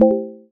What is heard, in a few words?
Default Beep; Emberfall